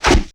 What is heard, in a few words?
Kung Fu Old School Whoosh, Hand To Hand Combat; Sony Pictures Sound Effects Series